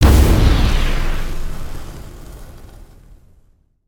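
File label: Fire Tornado End Blast; Spinjitsu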